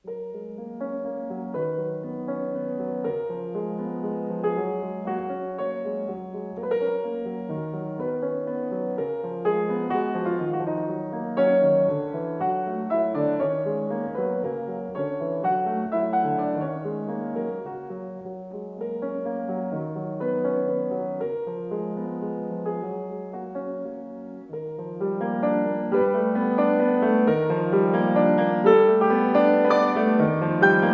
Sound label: waltz